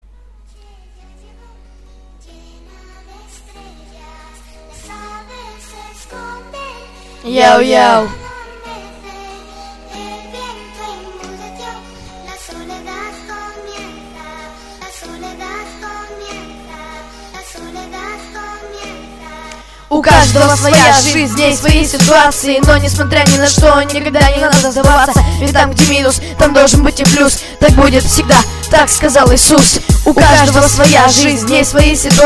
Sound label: rap